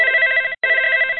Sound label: buy offer; Buildalot